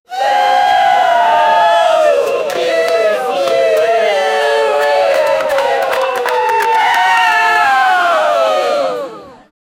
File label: massive crowd is singi, birthday